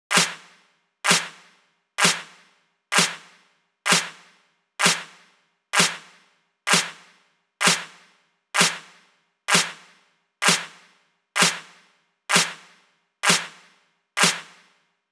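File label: 128 BPM Clap, A minor; Vengeance - Essential Clubsounds Vol.5; Demo Constr Kits; Highway Kit; Drums & Perc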